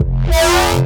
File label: Reese Bass F; Antidote Advent